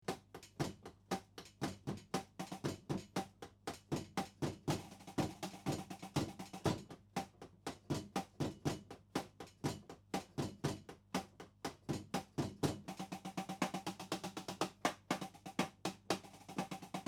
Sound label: drum vdrums